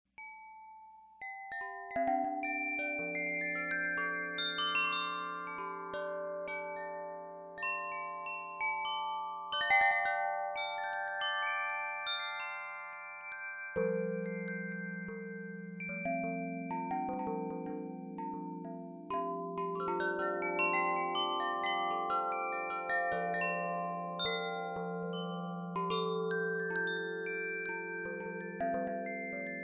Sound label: Chimes; Zen Chime